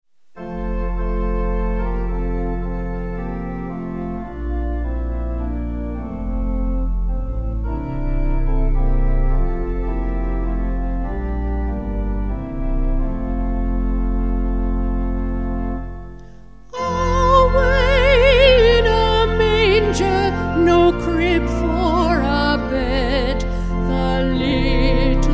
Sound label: Away In A Manger Vocal Organ; Mueller